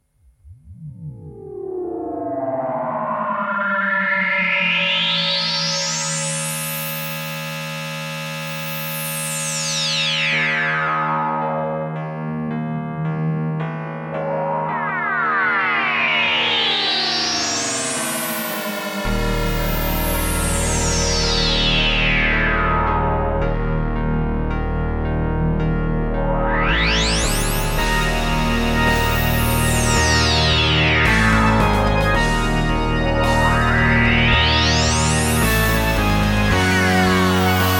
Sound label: Waldorf Pulse